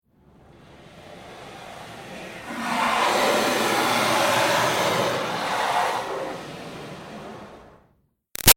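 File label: Water Spray Interior Car By Soft Long; Car Wash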